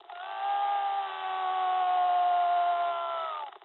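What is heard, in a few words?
aircraft destroyed scream, speech japanese; War Thunder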